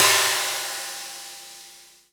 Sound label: Chin ri; EMU Orbit-3